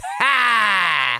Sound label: "HAAA"